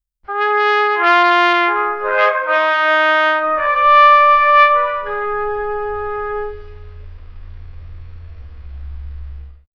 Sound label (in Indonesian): suara terompet kerajaan; ceremonial